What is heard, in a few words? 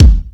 Electronic Kick; Yamaha PSR E305